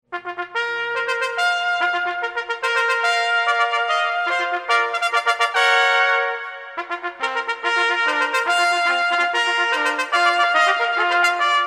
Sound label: Fanfare